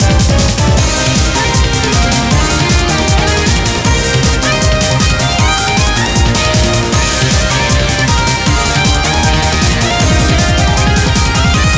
bgm

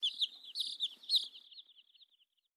KO Birds; Caffiend

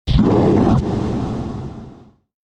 Satan Spit

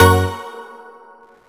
drum

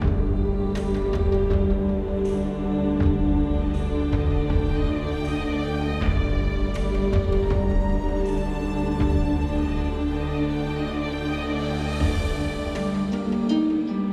Dark Forest

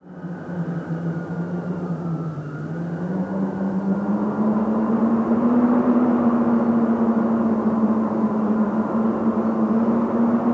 Nile river Wind howl

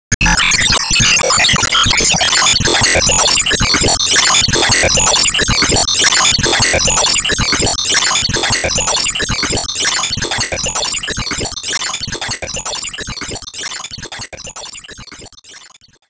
Enemy Boss Common Strongattack Loop; gamerip

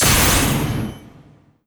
Teapot Saucer Attack Hit; gamerip; disney star smash; android; Enemy

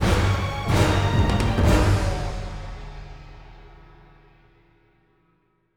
desert end, soundtrack

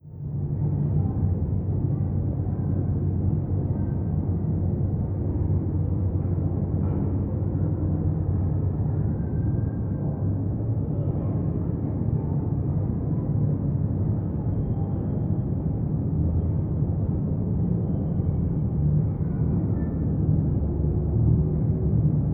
Cities rome Rumble; Google Earth VR

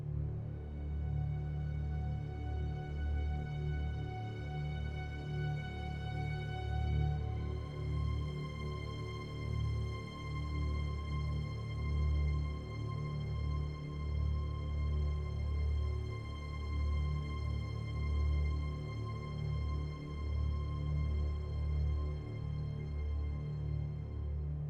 Base Layer 68varbpm 4-4meter; Sandstorm A

